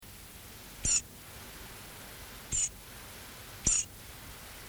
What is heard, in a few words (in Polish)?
kos - nawoływanie; wroblowe; turdidae; turdus